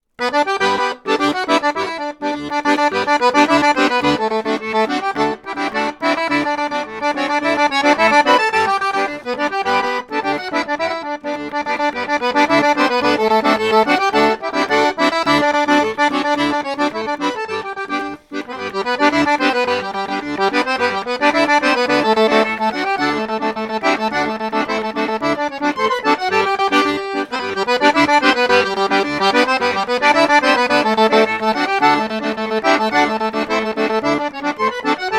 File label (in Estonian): Sangaste samba aeglane